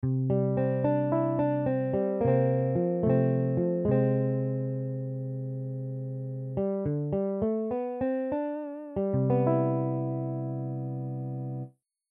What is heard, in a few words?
Ukulele Jingle